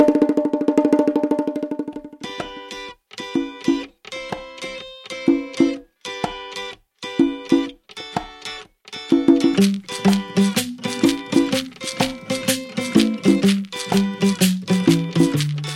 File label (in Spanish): musica de fondo island cafe